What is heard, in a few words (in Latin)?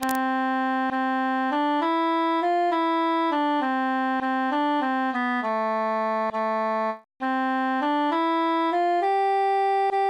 altus solo